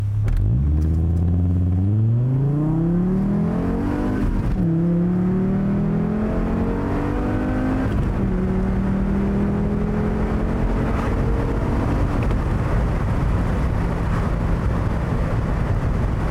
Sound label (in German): 20V Sound innen auf, sauger; tuning